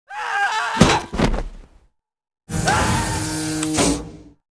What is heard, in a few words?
parachute target; secretagents